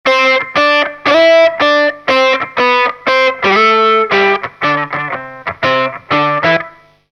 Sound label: Solo Octaves Hot, Guitar Effects Pedals; Pro Co